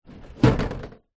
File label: foley plastic tub empty set down ground hard; zapsplat